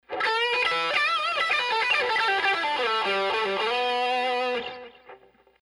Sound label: Lead OD 1 with Delay